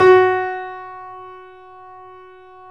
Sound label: Yamaha C7, 3D Pianos; E-MU Producer Series Vol. 5